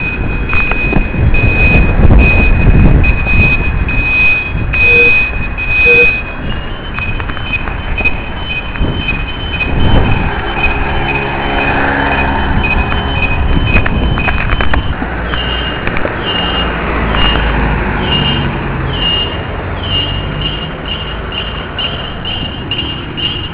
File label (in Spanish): alarma calle; guemes